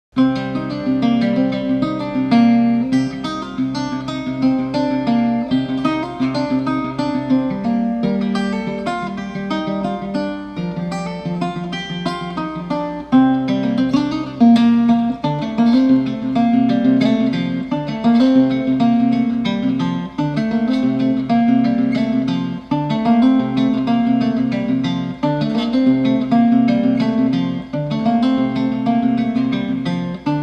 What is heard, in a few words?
Acoustic Cover Instrumental Version